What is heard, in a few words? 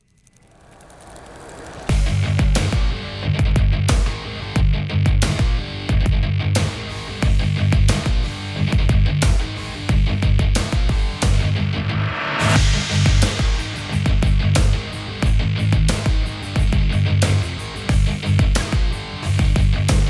Bass And Barrel